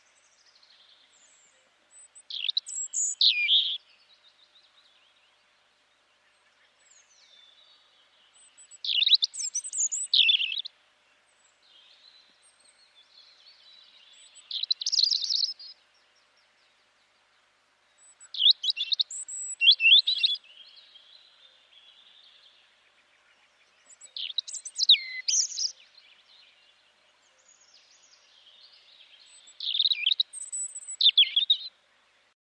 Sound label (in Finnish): punarinta